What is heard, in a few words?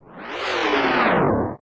Shake; Morpha